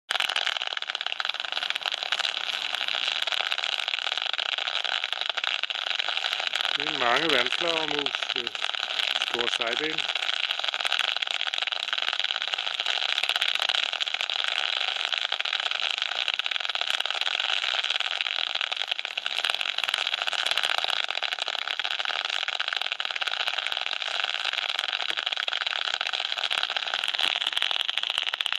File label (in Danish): vandflagermus